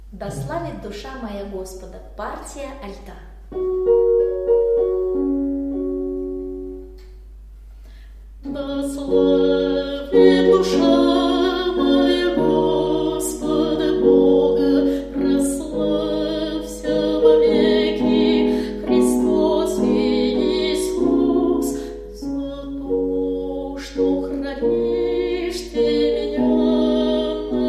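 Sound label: alto; epiphany; da slavit dusha moya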